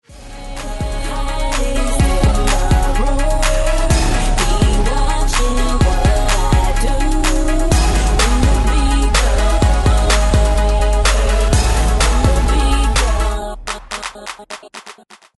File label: funk; multitrax